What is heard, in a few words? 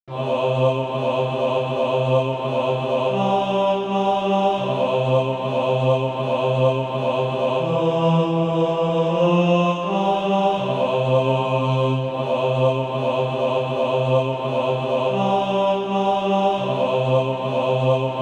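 bass, SATB Practise Music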